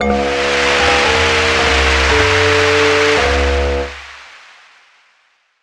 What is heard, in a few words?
You Lose